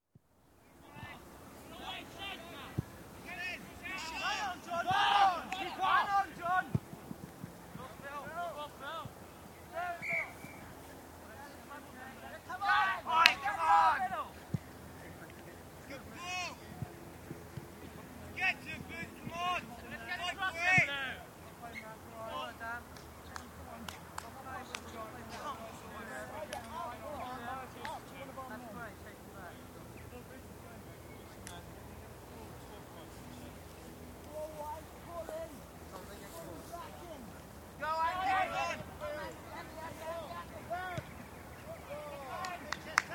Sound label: Football, teenagers playing a game; Football Sound Effects; BBC